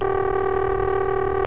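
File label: ring, telephone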